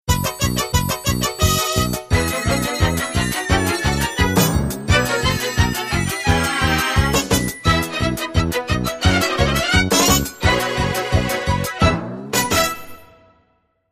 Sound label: Mini Toon Tune